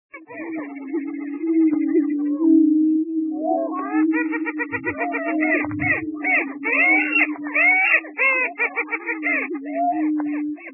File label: Greater Prairie Chicken